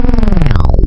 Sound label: Formant Saw, wavetables, Digital; Massive 4-Digital-Hybrid